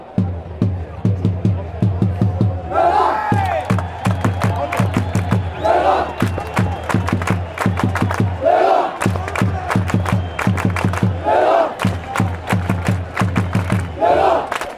lega aplausos

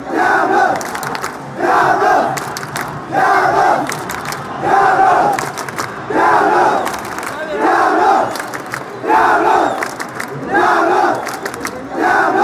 aplaudir aplaudir aplaudir, fan chants; diablos

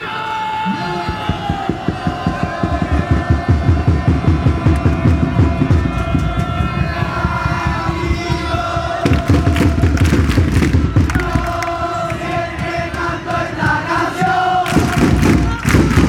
yo siempre canto esta cancion, fan chants

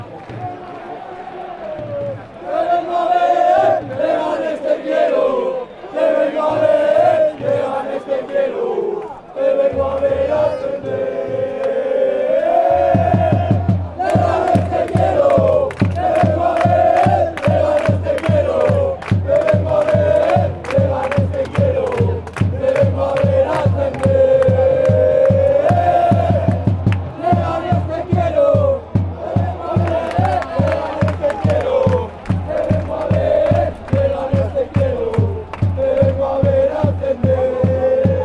leganes canto

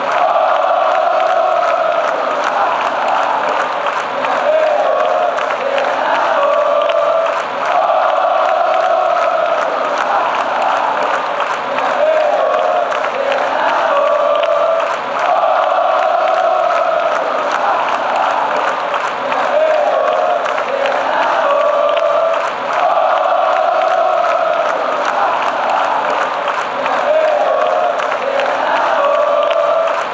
pongan huevos, chants; atlas

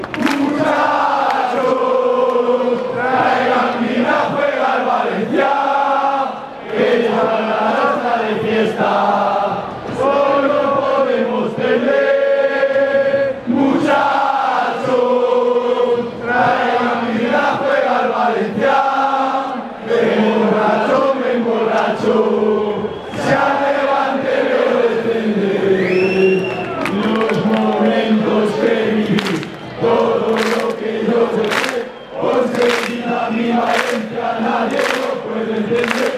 muchachos, chants

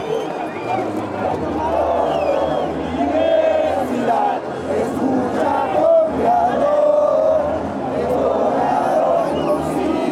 himno nacional universitario stadium

